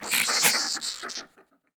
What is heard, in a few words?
RAT ATTACK